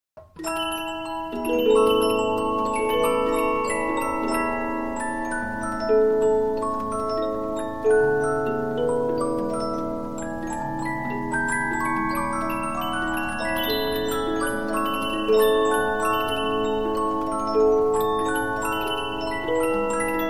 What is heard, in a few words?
Polyphon, musicbox; 15inch